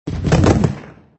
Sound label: Fall Effect